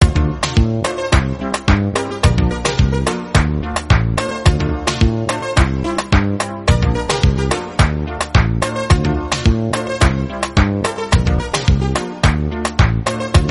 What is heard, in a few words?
instrumental music